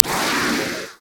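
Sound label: real undead golem damage up, monster; thedead3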